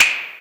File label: Yamaha CS6, Drums